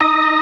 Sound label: 63 STOPS, Synth and Organ; InVision Interactive; Keith Emerson; The Most Dangerous Synth and Organ; ALL STOPS